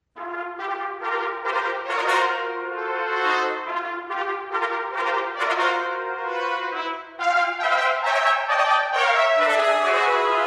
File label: Trumpet